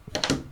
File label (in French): porte